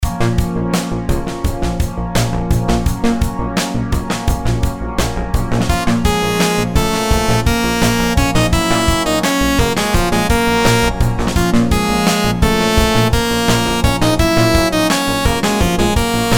rhythms simple dotted 8s 85

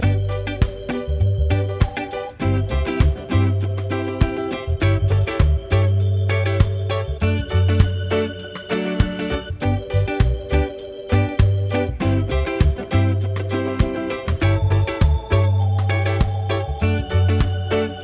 progs with applied chords

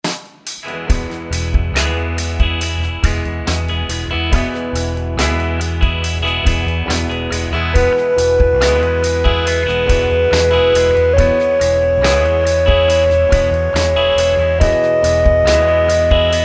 scale degree

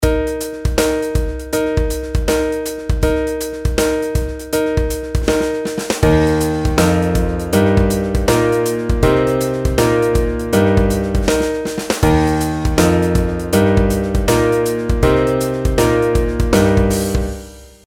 pitch patterns skipwise

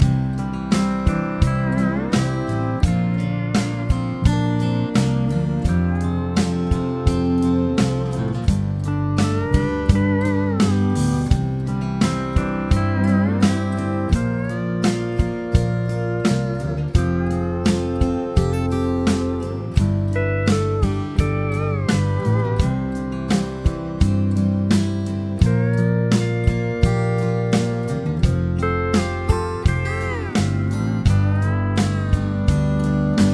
chord prog with mixture

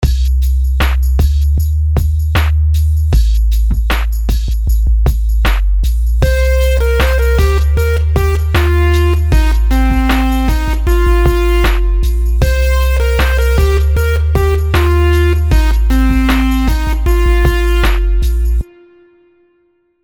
melodies simple 16s Cmin; melody

